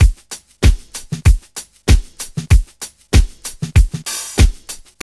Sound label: REAL 96, REALDRUMLOOP; Ueberschall; Jam Box